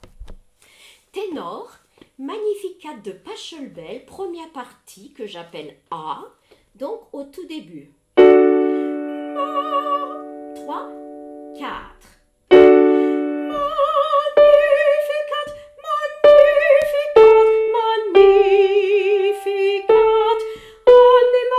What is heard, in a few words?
Magnificat Tenor; Pachelbel